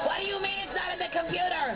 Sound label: computer